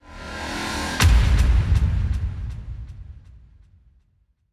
Combat Init; Void Bastards

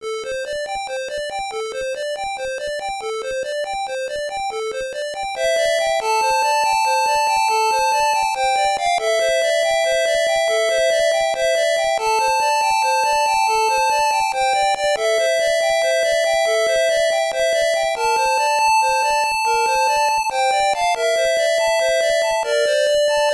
BGM, WonderSwan Color; Blue Wing Blitz

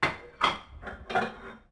Ambient Kitchen; the princess and the frog; Restaurant; Oneshot

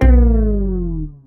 death stinger; SpongeBob Patty Pursuit

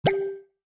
Coin; Fancy Pants World 1 Remix

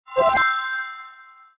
Star Earned; Cookie Jam

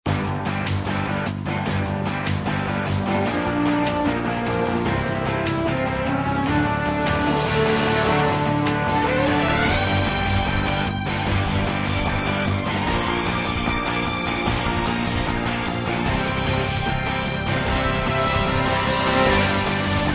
Action Loop; the world of cars online

